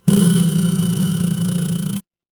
office phone vibrate

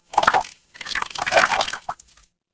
water swirl

